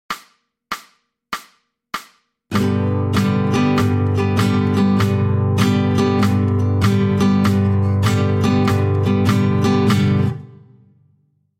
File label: 8 Rock